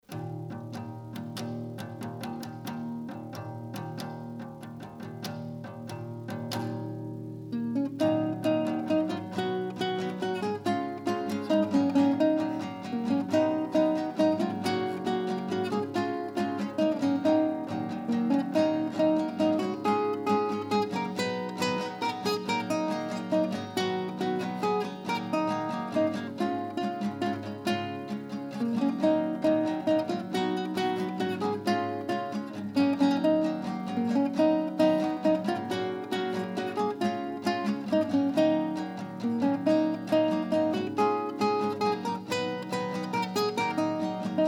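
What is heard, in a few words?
gitara, guitar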